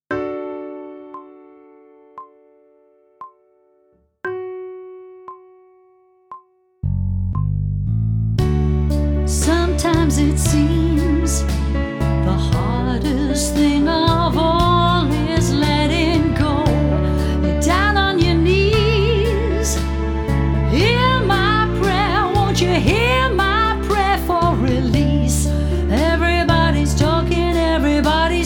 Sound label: Sight Singing (with vocal); pop vocals; grade 8